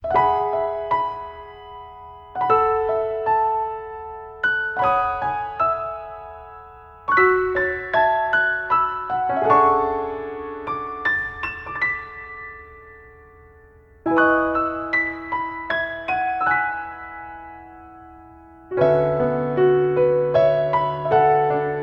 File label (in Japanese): 優しいとき